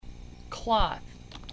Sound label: cloth